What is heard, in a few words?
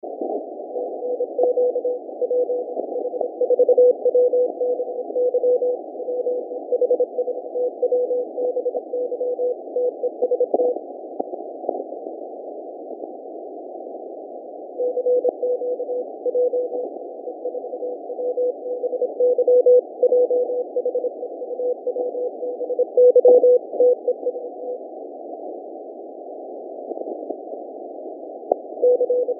CW QSB 20MB; Radio